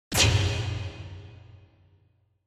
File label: Spotlight